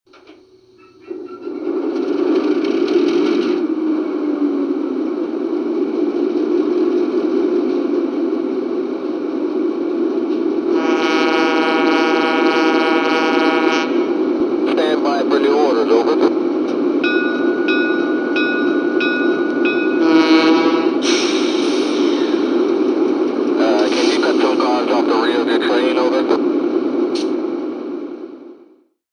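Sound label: FM H10-44, locomotive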